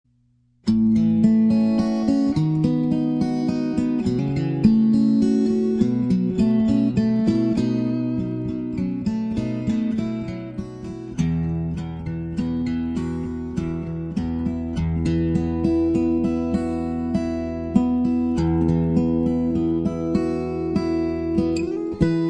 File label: Hawaiian Slack Key Guitar; Away In A Manger